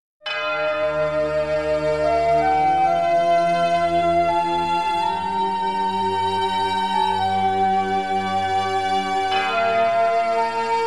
spa music relaks, 432 Hz

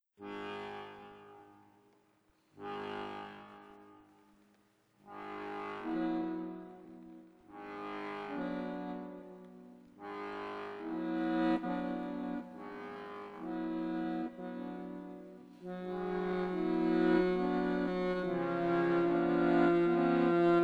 duet akordeonowy, 432 hz; sibelius vals trieste

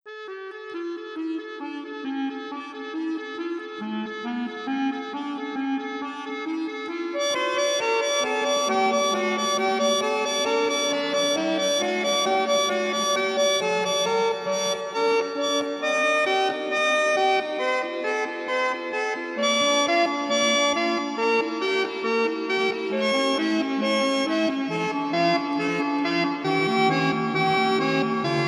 duet akordeonowy, fuga, 432 Hz; bach

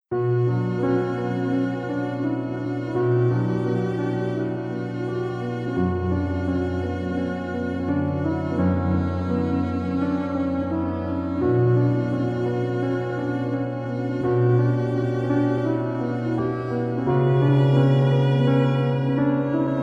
pozytywka 432 hz

